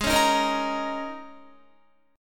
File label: Ab Suspended 2nd Suspended 4th, down, Guitar; Absus2sus4; strummed; 4F,4A,5C,5G,5C,6E; chordshape